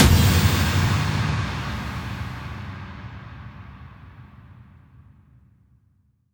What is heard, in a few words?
Impacts, Effects